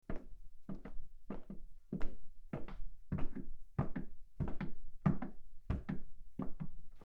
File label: Wooden Foosteps; horror; horror sfx vol 2